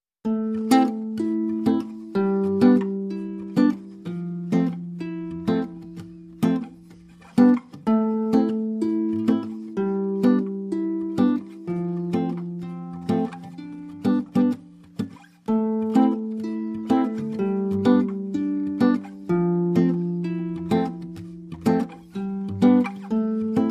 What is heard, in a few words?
Reggae Am Gipfel, Reduced